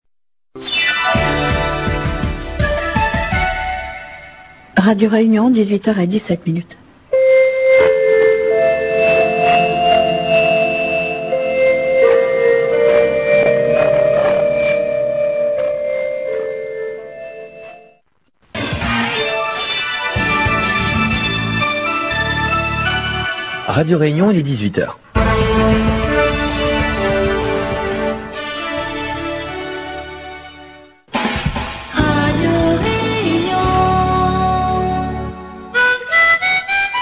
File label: interval signal; radio reunion